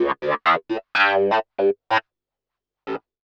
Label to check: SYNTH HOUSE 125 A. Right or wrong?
Right